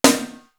HYPER PIC, TOM; East West - Global Sounds; D4 BD SD TOM

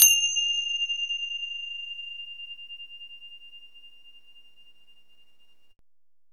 FING CYMBAL, FINGA CYMBALS; Zero-G Ambient 1

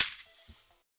tambour, tambo shaker

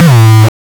909 Dist Reso Crushed - A2, Kicks; Drums; Mbase 11 Kicks

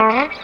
SLIDE GTR; Hip Hop; E-MU Formula 4000 Series Vol. 1; Hip Hop Nation; Raw Phatt

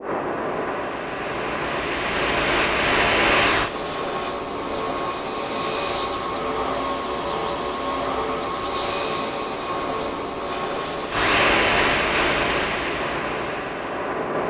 ACCELERATE; Distored Reality

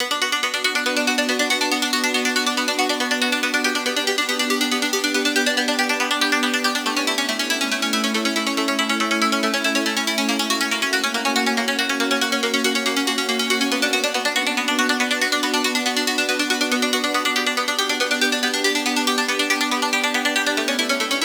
140BPM B; Vengeance - Essential Clubsounds Vol.4

